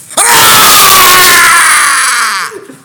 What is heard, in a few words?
scream